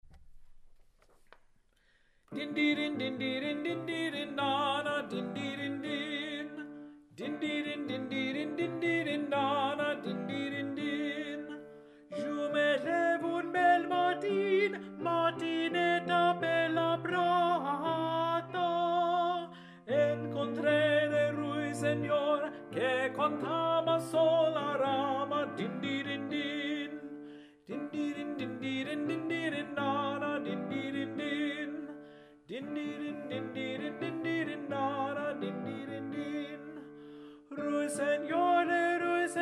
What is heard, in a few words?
Alto; Dindirindin, Anon.